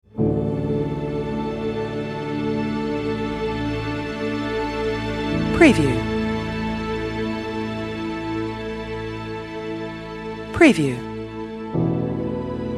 Dramatic Film Strings Cinematic Emotional Soundtrack Background Music